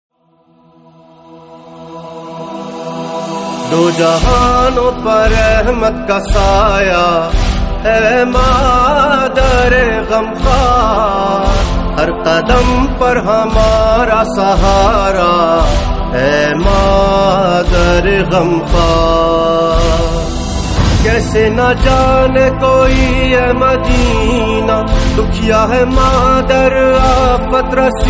Farsi Noha in Urdu; Ayyame Fatemiyya 1442

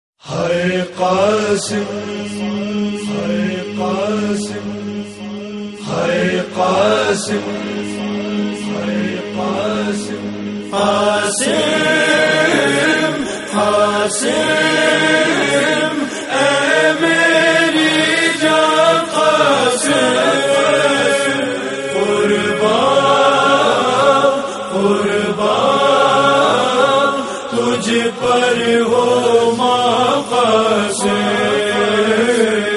Noha, nauha; Noha 2022; New Noha Hazrat Qasim; NAWHA 1444

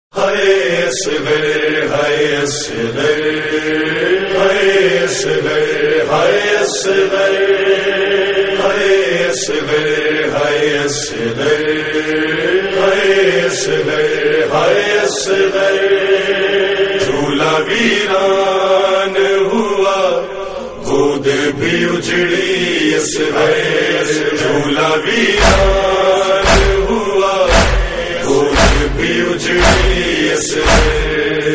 Noha; Shehzada Ali Asghar

